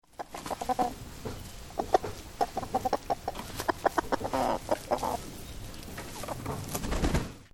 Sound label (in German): Hühner freilaufend